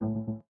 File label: Windows Hardware Fail; Windows Server